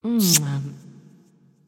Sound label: freespin enter kiss; Back to 70s; wazdan